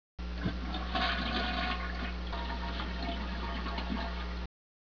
flush; toilet flushing

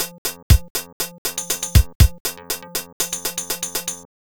arpeg

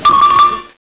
twitch

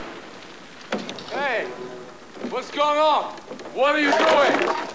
firestorm

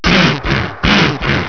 chug chug chug chug

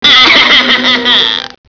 cry baby

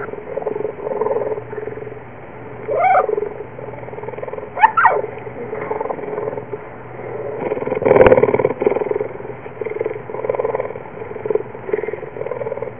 cougar purring

